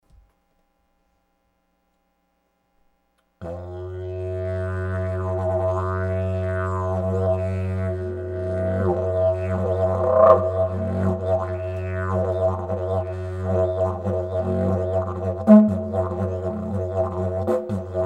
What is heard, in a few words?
Didgeridoo Rev